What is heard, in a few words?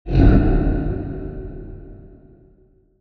quest failed; Mount&Blade Warband